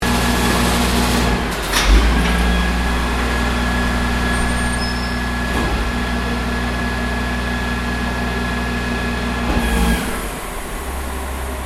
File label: Truck Rubbish Collector Emptying Bins; Generic truck sounds; Vehicles